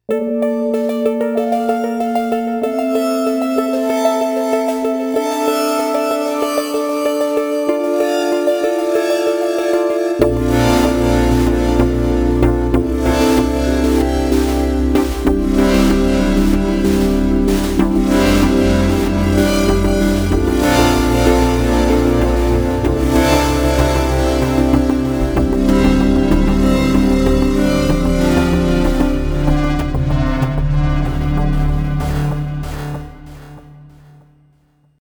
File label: MODX Future Pad AWMFM